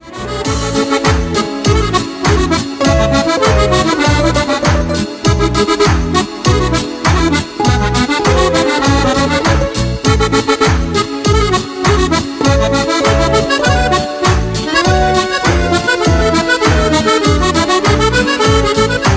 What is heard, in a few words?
balalaika